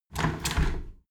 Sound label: window handle squeak turn close heavy; objekte; fenster